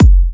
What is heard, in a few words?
129BPM Kick; Vengeance Samples; Micromax Kit